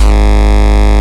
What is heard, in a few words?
Bass, Root G#; Vengeance Samples